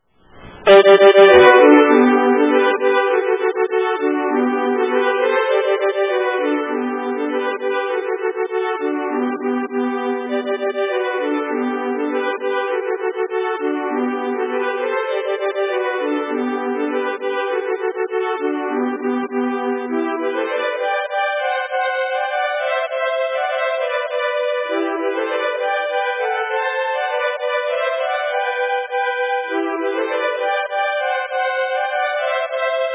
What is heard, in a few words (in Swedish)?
Tjuvabubbarnas polka